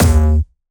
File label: D#2, Jumpstyle Kick; Bassdrums; Jumpstyle Multis; vengeance essential club sounds-3; VEC3 Bassdrums